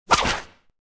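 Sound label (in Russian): звуки дикого запада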